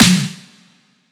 snare hammer; Drum One Shots; SD MIDNIGHT Sample Pack; Slate

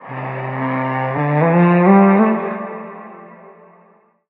vocal air C; Slate; AURORA Sample Pack; Vocals

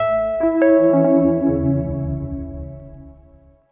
pluck leaves E; Melodies; One Shots; Slate; AURORA Sample Pack

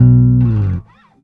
Bass Dive FX Octave B, Electric Bass One Shots; Slate Digital BASSHOLE Sample Pack

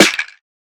snare rattlesnake; one shots; SEISMIC Drum Sample Pack; Slate

